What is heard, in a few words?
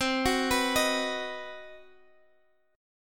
C Minor Major 7th Flat 5th C